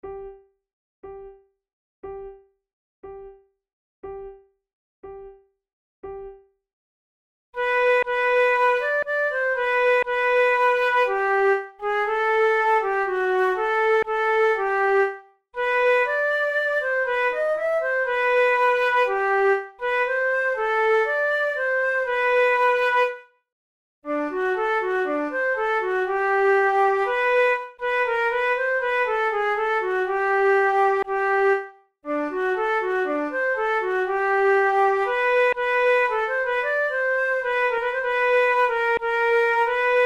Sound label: portuguese air duet, flute